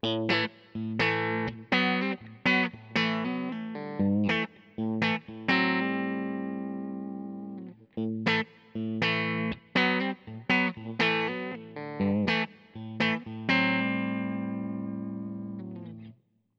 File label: Splitted Bridge Humbucker Clean; Tesla Pickups vs. Roswell; Seymour Duncan